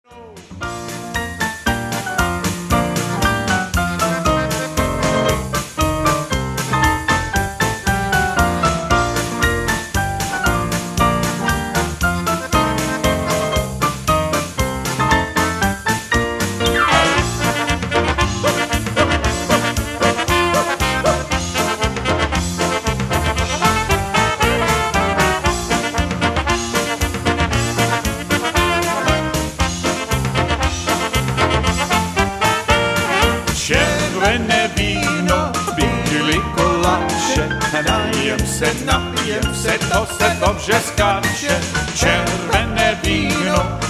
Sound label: Polka